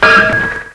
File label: KLUNKY; BELLS